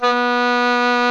DOUBLE REEDS; OMI - Universe of Sounds

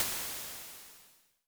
Crash Waves, Vermona Mono15 Drums

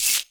Shaker; Equipped Music; Loungin House

